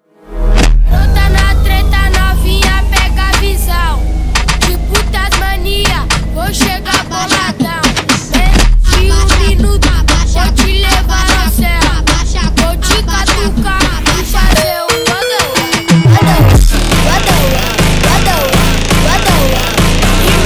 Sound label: Phonk Super Slowed